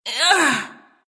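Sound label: pain, Russian; dota2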